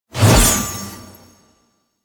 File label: Sword Attack Whoosh; Weapons; Melee; Warframe; Orokin Weave Sword; Weave Boss